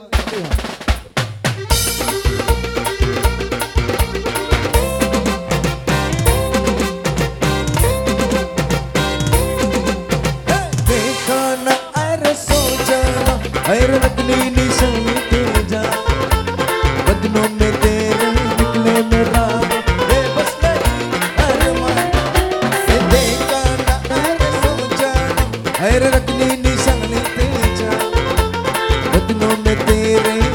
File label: DANCE STYLE FAST HIT MIX SONGS NONSTOP, LIVE